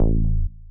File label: BASS